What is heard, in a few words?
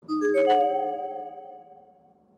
Message Recieved